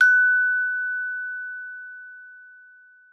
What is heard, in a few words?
F#4, GLOCKENSP S; Xsample VOL-4